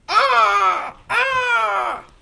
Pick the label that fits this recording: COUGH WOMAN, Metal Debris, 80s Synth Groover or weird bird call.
weird bird call